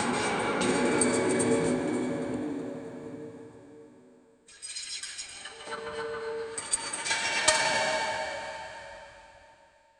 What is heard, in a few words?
metallic echo